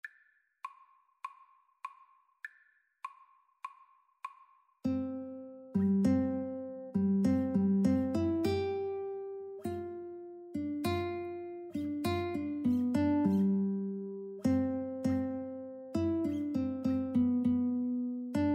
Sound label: Guitar Duet